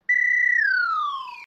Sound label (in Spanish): flauta